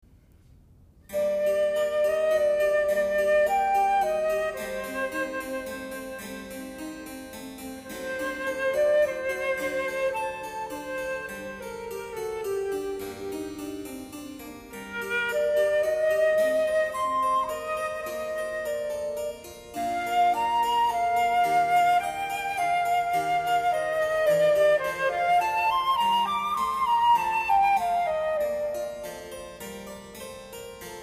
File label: Flute